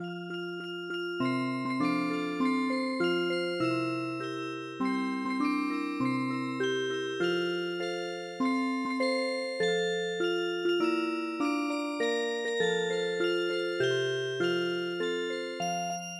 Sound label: happy birthday to you Music Box; ミルドレット・ヒル; パティヒル